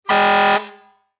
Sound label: Emergency Fire Truck Horn Honk; Emergency Vehicles